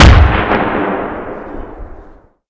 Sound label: Inf Weapons; Red Orchestra 41-45